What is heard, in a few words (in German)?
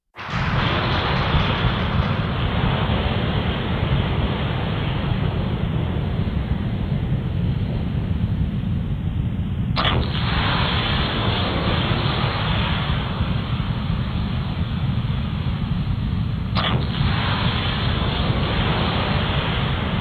vulkanausbruch; Suedsee